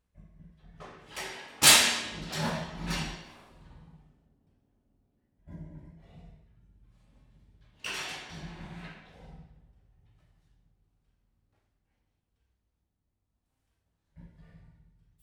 rácsozat, ketrecajtó; jaszbereny

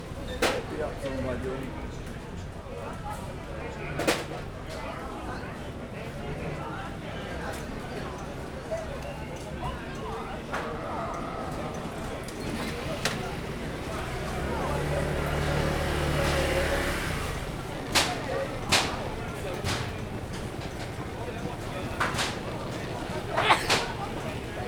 utcai piac tüsszentés

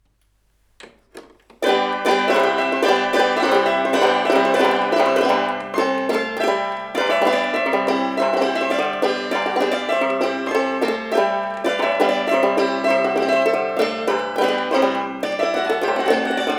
automatikus citera, verkli; orfeusz; 1891; lipcse

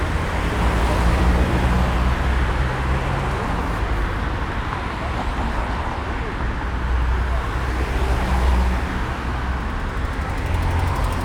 helyközi beérkező járat, busz távolsági; 7 haznal

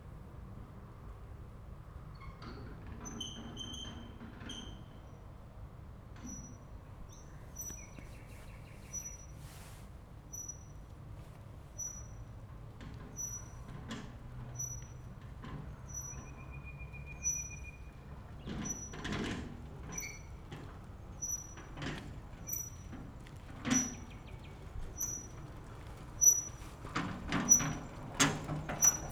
balrol jobbra felutona mikrofon, talicska

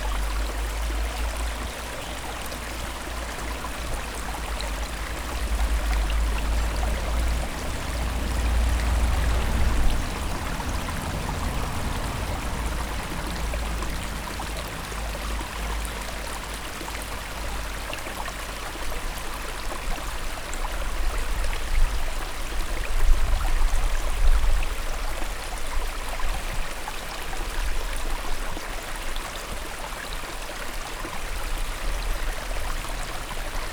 csacsogo messze egy auto balrol szello, patak; visegrad

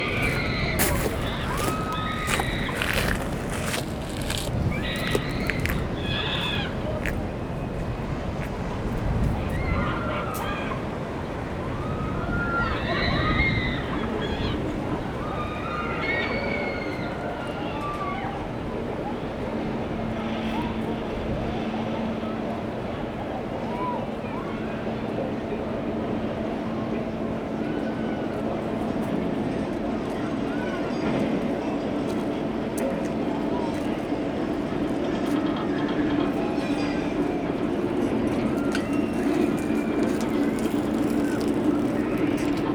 masik szigeten levo vidampark

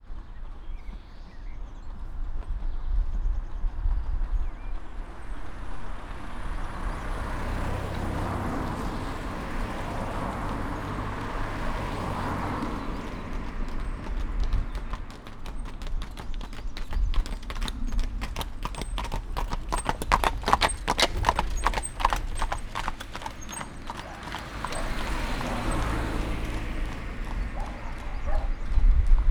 balrol erkezo szel, lovaskocsi; visegrad

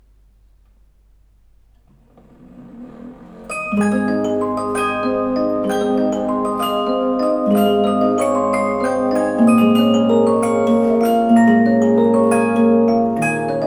zenedoboz femlemezes; stella; svajc; verkli

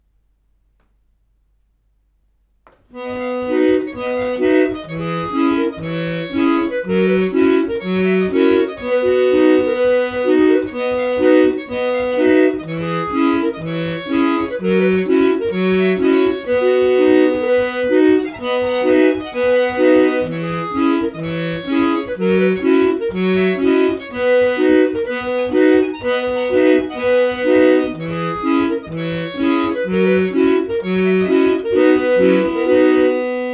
organette hangu; ariston; paurehrlich; 1895; lipcse; verkli

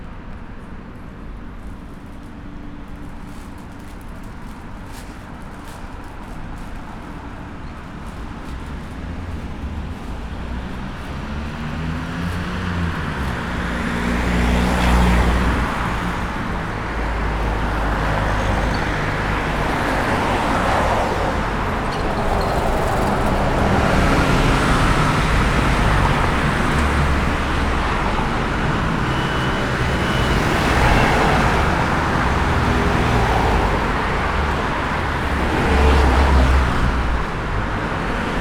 busz vaganyutcai megallo kivulrol; 105os; gyongyosi utca fele

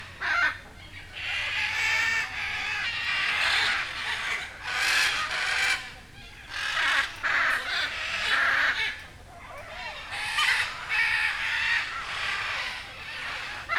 kek sarga es zold piros arak, madarpark

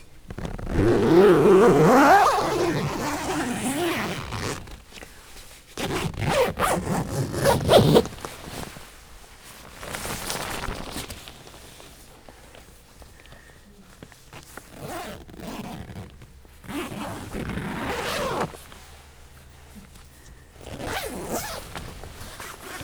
dupla ajtaju sator kiesbe cipzarozasa, cipzar; vizhaz; cardioid

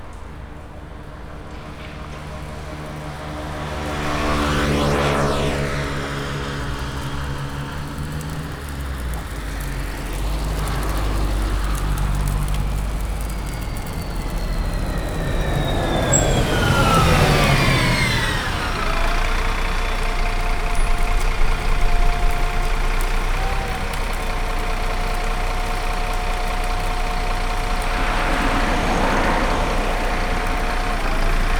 dagály fürdő megálló kívülről belváros felé, busz

